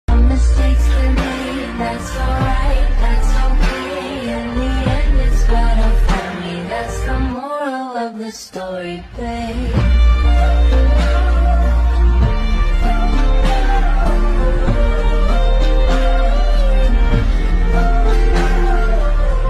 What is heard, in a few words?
bgm mix bgm; varsham; weathering with you